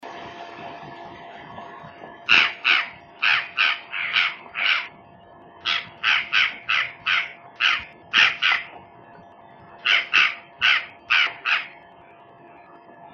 Urraca Morada, Cyanocorax cyanomelas, Purplish Jay; Corvidae; cantos